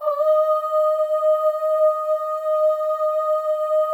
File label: FEM OHS, Symphony of Voices; Spectrasonics